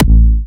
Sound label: Harder A4, Kicks, Big Room Kicks